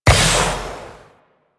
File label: Vial Gas Shatter; Warframe; DE; Warframes; Alchemist; Elements; Ability